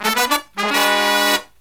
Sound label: Killer Brass Riffs, 108 soul; USB Soundscan vol.29 - Killer Brass Riffs; AKAI